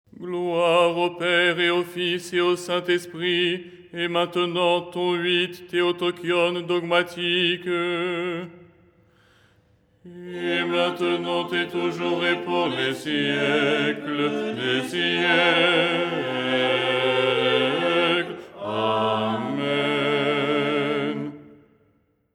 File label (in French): basse